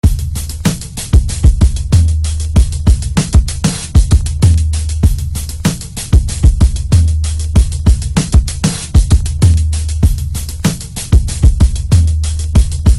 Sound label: anthro drums